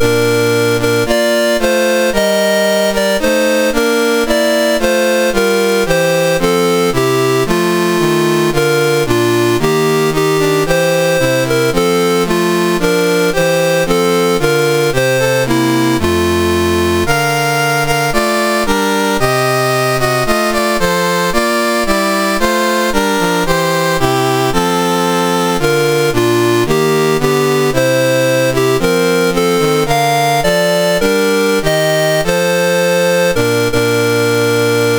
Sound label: Antigua barb, National Anthems; MOS6581R2; Dave Marquis